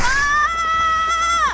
barf bag screaming sound effect

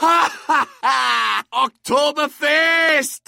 oktoberfest

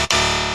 incorrect sound effect

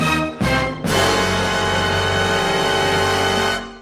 dun dun dunnnnnnnn

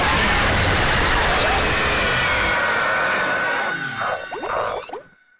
jumpscare

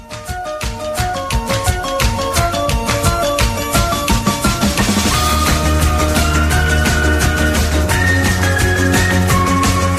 opening song; gravity falls

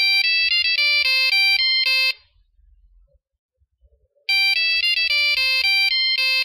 satellite phone; jurassic park iii

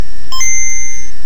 gbc startup

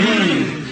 mmm rap

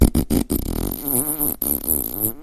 oopsy daisy fart

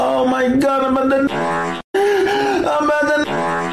fart; dreamybull

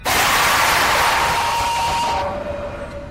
weird strict dad jumpscare sound

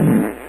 small fart

